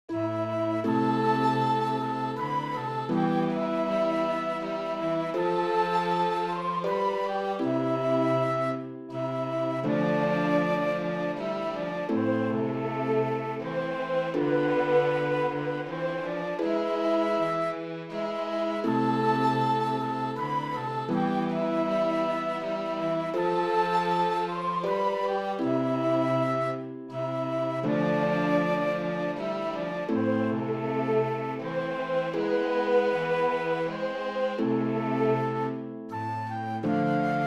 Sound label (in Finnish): harppu